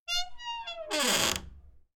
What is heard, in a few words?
Wood Door Fst Motion